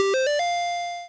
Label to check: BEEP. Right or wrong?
Wrong